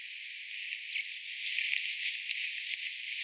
mika laji 3 rannan ruovikosta kuului voisi olla junco hyemalis

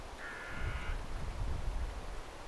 varis

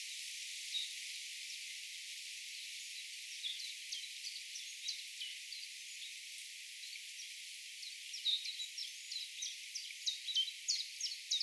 on todennakoista etta tama laulaja voisi olla nuori vuoritiltaltti

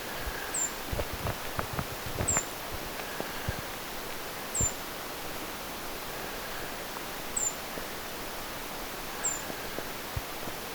ti-puukiipija; toinen ti-puukiipijä

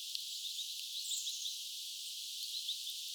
ilmeisesti erikoinen sinitiaisen laulu

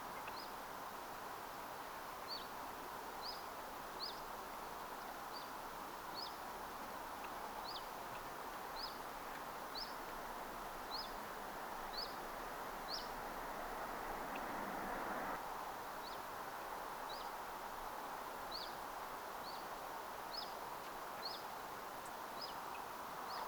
vifviy tiltaltti eli ilmeinen nuori vuoritiltaltti

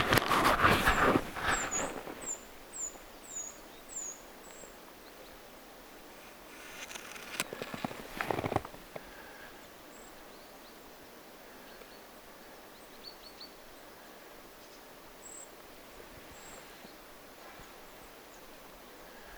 tuollaisia ääniä sillä ilm itäisellä puukiipijälinnulla myöskin

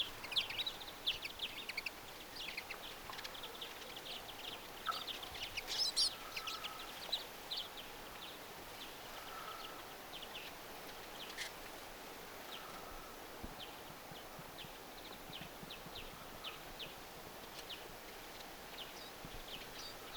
puidun rypsipellon aarella mm peippolintujen aania

